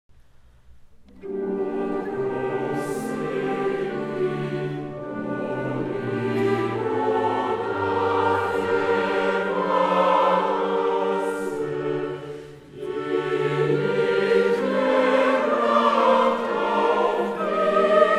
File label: choral; BWV 245